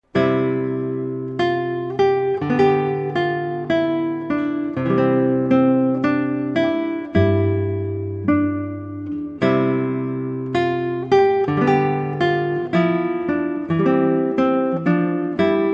Classical

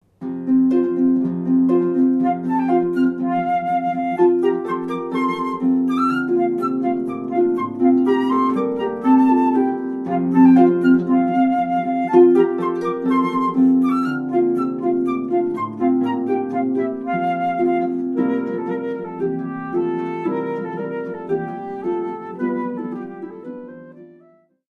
Tambourin, harp

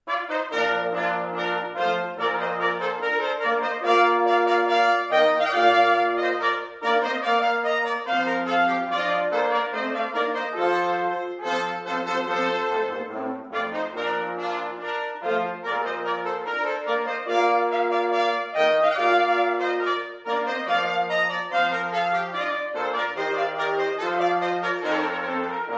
Rondeau, Brass Ensemble; Mouret

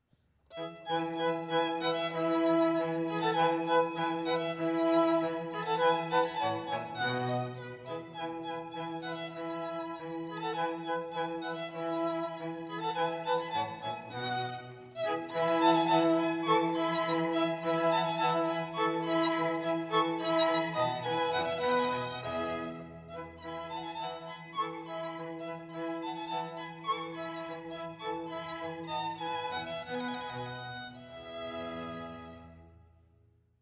Spring String Quartet; Vivaldi; Classical

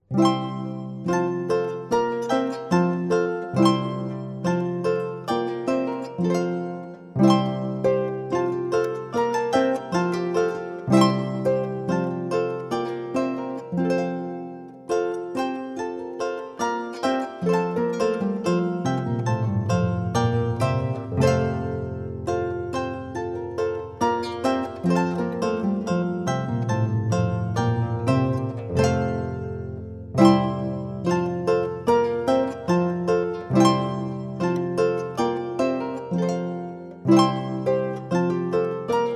Recessional, Harp; Lantero

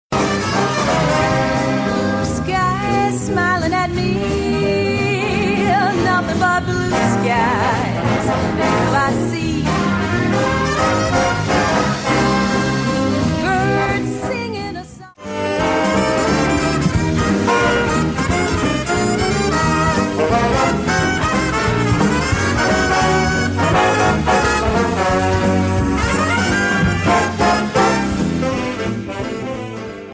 big band; Swing

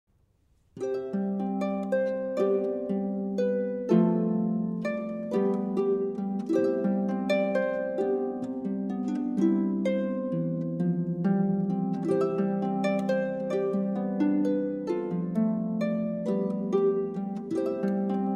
harp

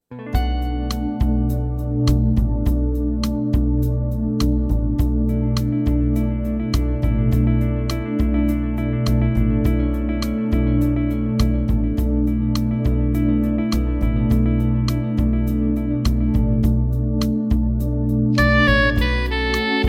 jazz saxophone

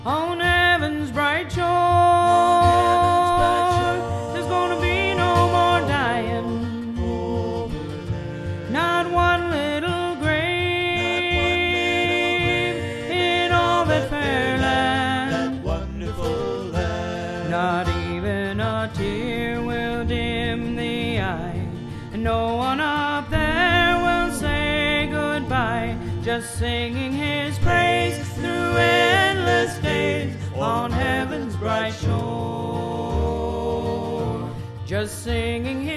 Bluegrass; On Heavens Bright Shore

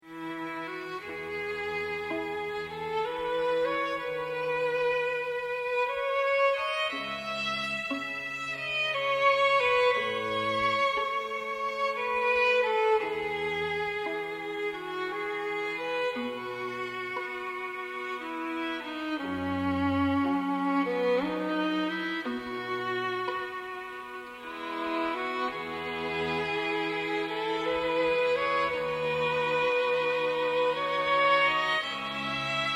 String Quartet

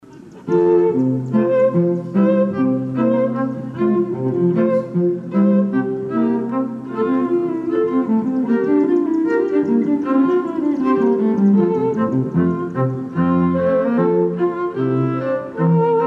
String Quartet

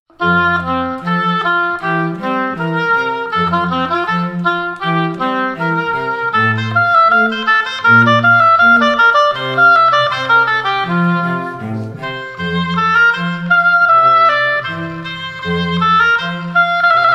Classical Trio

